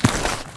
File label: player dirt